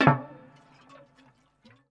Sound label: ethno 125